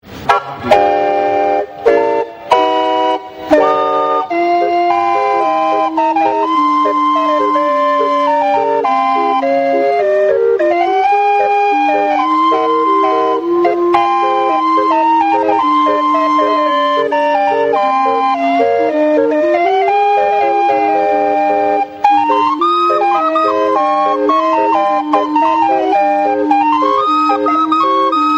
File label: calliope